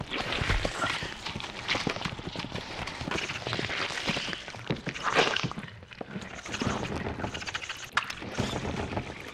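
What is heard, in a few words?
Swarm Underfloor loop; silent hill homecoming 2008 gamerip pc ps3 x360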